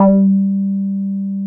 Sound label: G3, Bass; E-MU Producer Series Vol. 6 – Analogia Project (CD 1); Boom da Bass 2